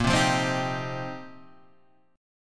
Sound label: F 7th Suspended 2nd Suspended 4th